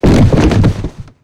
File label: fall; Quake 4 Alpha; id software; strogg; tik